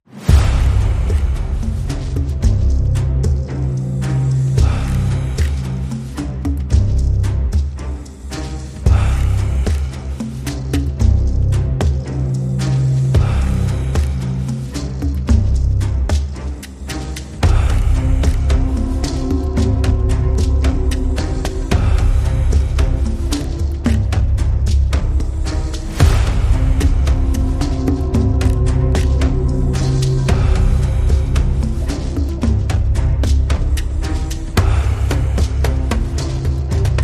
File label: No Flute FX